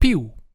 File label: pew; flutter; audio soloud